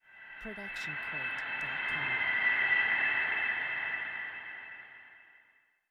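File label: scifi ambiance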